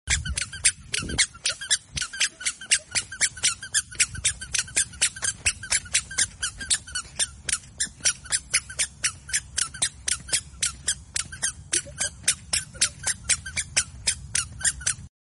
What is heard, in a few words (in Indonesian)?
sepatu berdecit